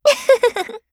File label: laugh; Eternal Return; Garnet; KOR